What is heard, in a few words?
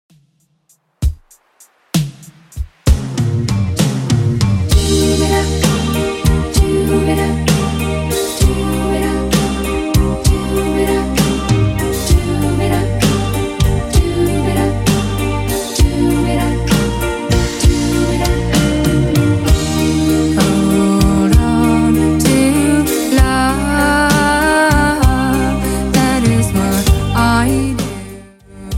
90s Redrum 65 bpm